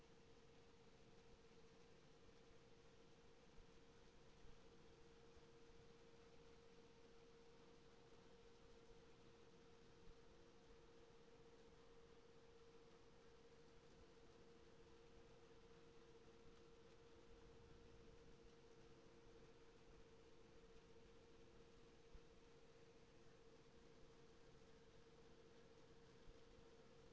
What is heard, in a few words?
HYDROPHONE 16000Hz; JASCO AMAR HYDROPHONE 740